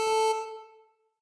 start; Techmino

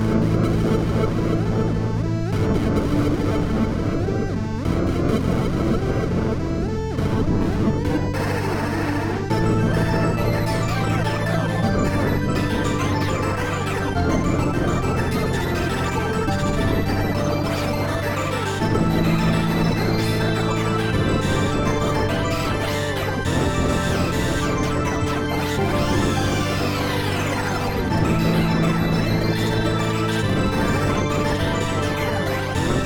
distortion

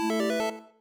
connected, chiptune; Techmino

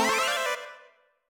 spawn; Techmino

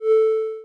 spin; Techmino

